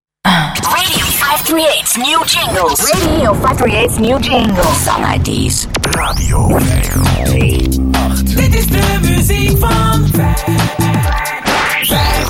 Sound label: Radio Jingles; Radio 538